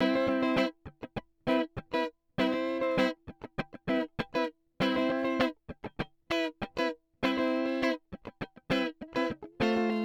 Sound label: Guitar Rythm Rotary freq 2Hz